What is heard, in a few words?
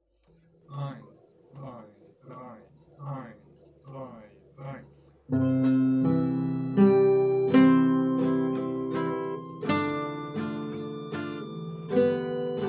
bunessan guitar harp chords; Morning Has Broken (Bunessan) - harmonica & guitar & chords